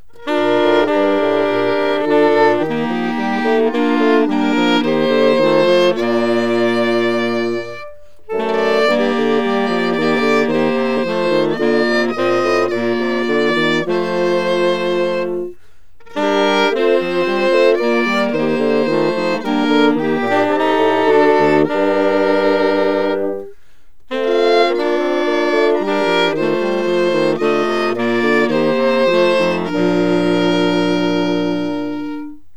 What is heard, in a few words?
Bach Chorale